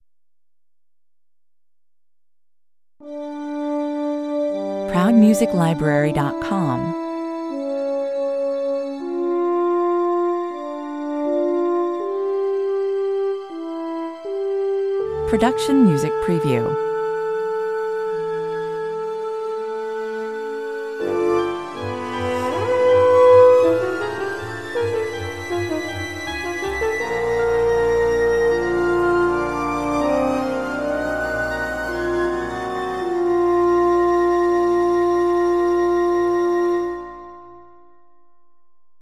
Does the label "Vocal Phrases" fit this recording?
No